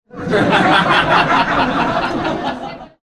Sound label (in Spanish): risas